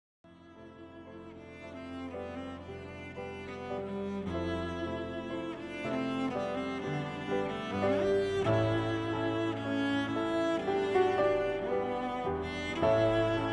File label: Cello; Heavenly Cello; Autumn